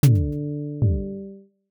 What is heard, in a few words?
basement pipe knock